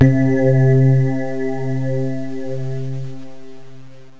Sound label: pad piano2 c2; Terra Sound Library; construction set